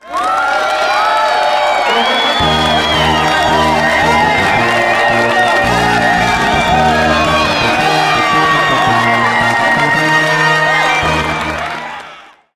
win; RS Games Sounds; Apples to Apples

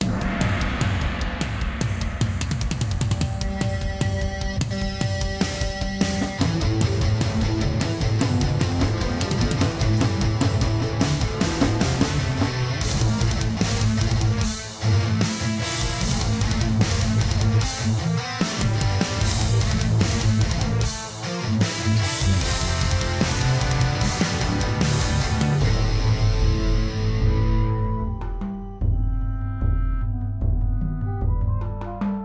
menu mus; Original TK Sounds

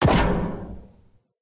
metal fall; Golden Crayon Sounds

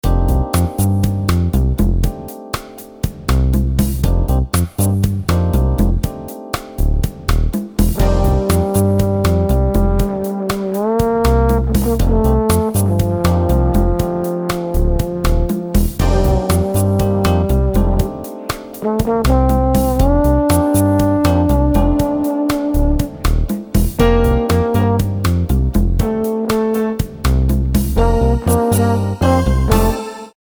background; RS Games Sounds; Farkle

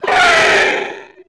monster death; Original TK Sounds

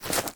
branch step; Golden Crayon Sounds

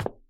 stairs step; Original TK Sounds

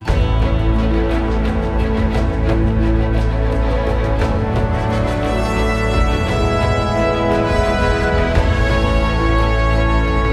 very big victory; Manamon Music; Manamon 2

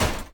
wall metal; Original TK Sounds

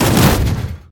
metal hard land; Original TK Sounds

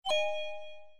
App Switch Border; Salem's Sound Schemes

